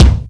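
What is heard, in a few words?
DOUBLE KICK; Greytsounds; Scott Peers Sound Engineering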